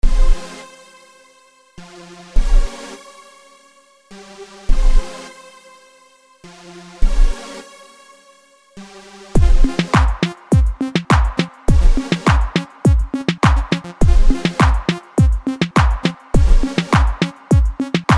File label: beat electro flow